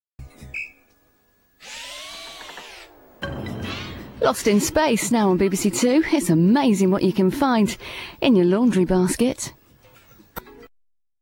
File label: jingle; bbc